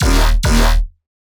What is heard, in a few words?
Dirty Bass D# 140, Bass Hits; One-Shots; River; Ghosthack - Color Bass Essentials